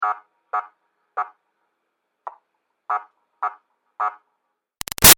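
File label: Siren Police Buzzer Short Blips Medium Far; Emergency Vehicles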